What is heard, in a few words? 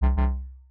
error